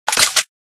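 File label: gp30 grenade load; Boomsticks and Sharpsticks; weapons; ak74m beard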